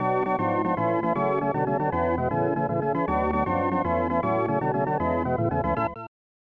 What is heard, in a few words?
Fanfare ~ Classic; super bases loaded 3; snes